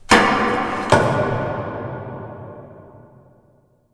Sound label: mall random, ambient